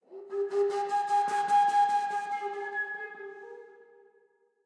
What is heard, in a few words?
bird Flute; templeofnudges